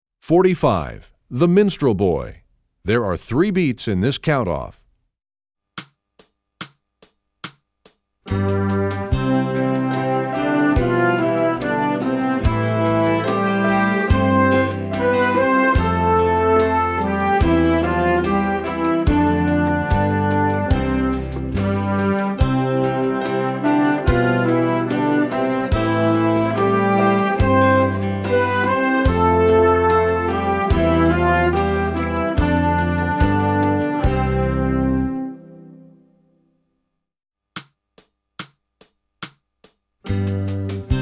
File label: trombone; essential elements